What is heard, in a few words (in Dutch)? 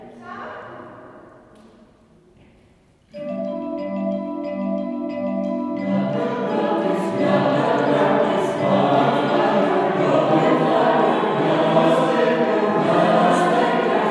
Jingle Bells, Koor